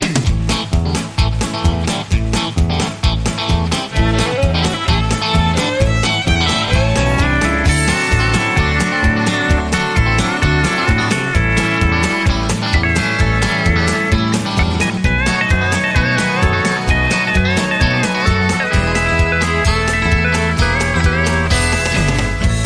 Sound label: Country Pickin